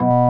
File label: KEY, Org Ham; Club-50 - Foundations Roland; Organs